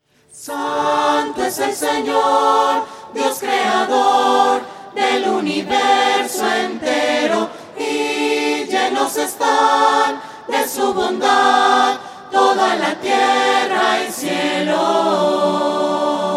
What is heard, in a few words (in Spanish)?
santo fresa voces; misas 2012